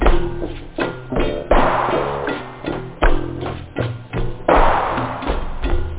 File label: swing, loop; da capo vol1; instruments